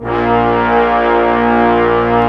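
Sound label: brass section, large ensemble long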